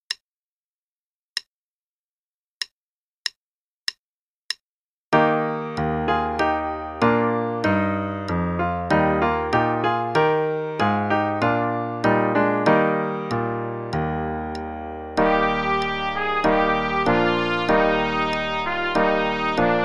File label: March both parts, qn=96, trumpet; Play-a-long; Belwin; The Emperor of Germany's